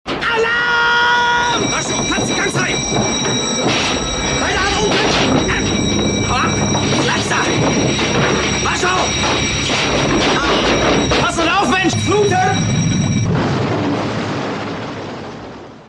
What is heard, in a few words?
crash dive ringtone